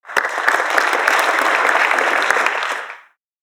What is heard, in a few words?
Crowd applause light reaction